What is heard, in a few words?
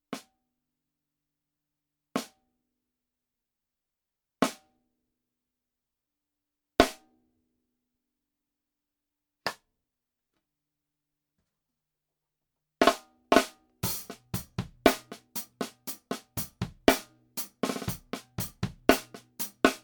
TAMA LST1365 hi